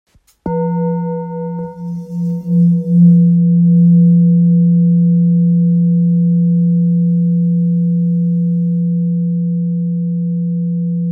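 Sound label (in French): Bol ancien fa, bols chantants tibétains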